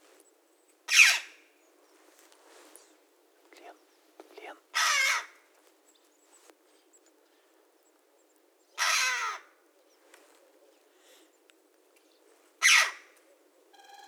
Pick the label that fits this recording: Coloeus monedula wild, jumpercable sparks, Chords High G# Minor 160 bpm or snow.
Coloeus monedula wild